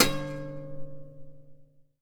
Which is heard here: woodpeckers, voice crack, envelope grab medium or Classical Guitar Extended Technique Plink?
Classical Guitar Extended Technique Plink